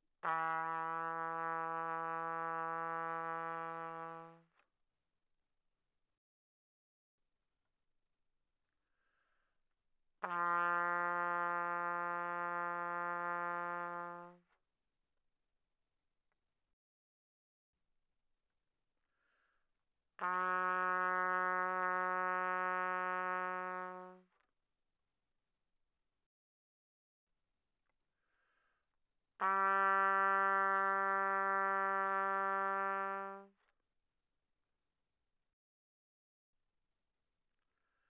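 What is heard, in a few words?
Trumpet no vibrato pp E3 B3; Brass; Bbtrumpet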